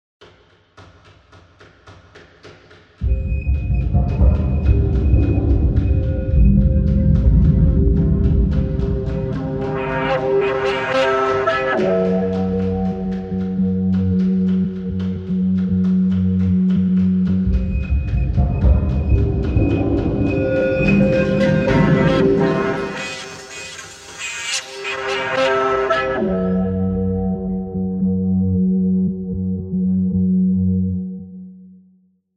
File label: dramaturgy